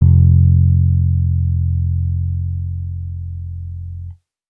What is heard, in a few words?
Dusty Analog Bass F, Bass Guitar